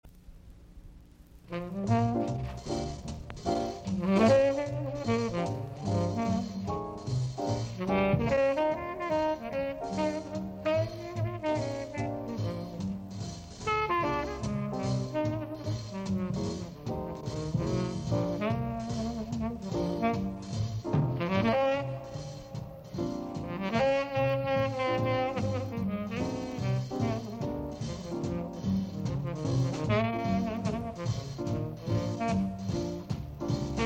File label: Db Blues